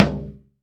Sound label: Tom low